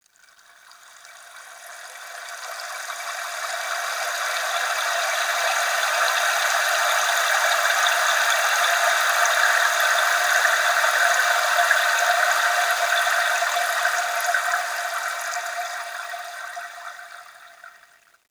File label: Trickle Water Stream; Chalice Well